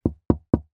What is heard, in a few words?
knock knock